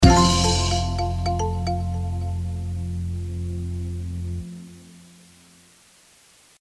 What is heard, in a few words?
Short game loop end; Kaiju Khaos